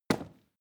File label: wood drop